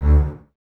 BSS D 2, String Ensembles, Basses, f Legato; Miroslav Vitous; 90 s Sample CDs

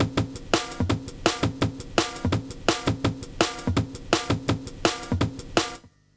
LOOP, Explosive Jungle

